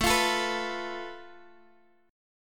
A Suspended 2nd Sharp 5th, Guitar, Open F; Asus2#5; strummed; x,16,17,16,14,x; JGuitar

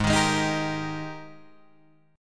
Ab Major 7th Suspended 2nd Ab Guitar Drop D

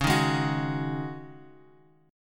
Db Suspended 2nd Suspended 4th Db Bass Standard; strummed; JGuitar

